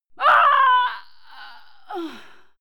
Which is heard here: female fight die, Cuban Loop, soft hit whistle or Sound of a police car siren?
female fight die